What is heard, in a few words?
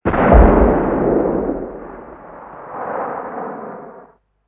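explosion distant; modern warfare 2; amb elements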